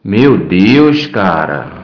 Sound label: berimbau